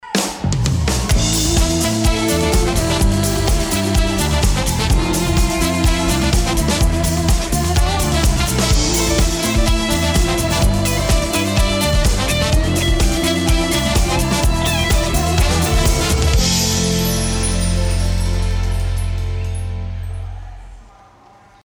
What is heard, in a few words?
Salsa